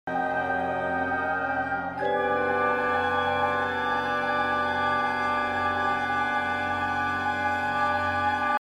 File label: Clocks And Clouds; Ligeti